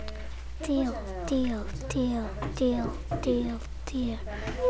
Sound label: deer